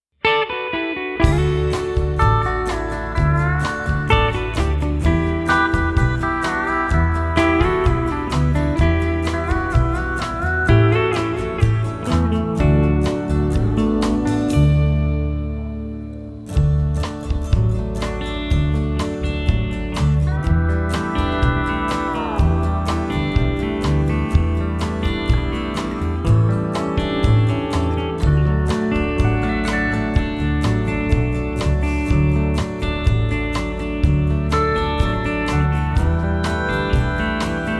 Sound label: Instrumental